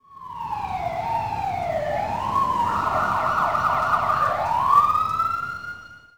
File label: siren; langeles